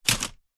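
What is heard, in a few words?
leisure toy drop into toy box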